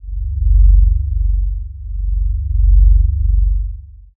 drone

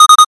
alarm

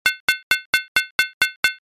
stir

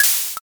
syringe

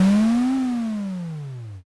dragon

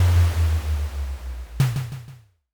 castle gate

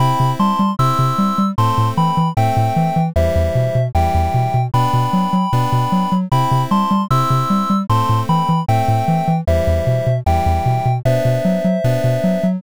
bgm

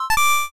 load

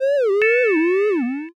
stun

